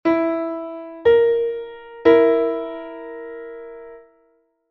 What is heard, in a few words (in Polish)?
tryton e1 ais1; konsonans i dysonans